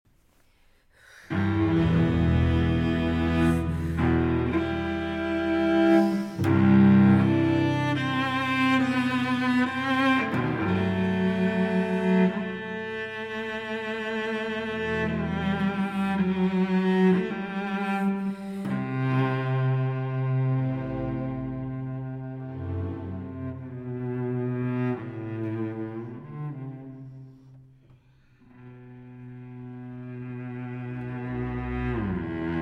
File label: Cello Concerto in E Minor, Op. 85 - I. Adagio; Elgar; Moderato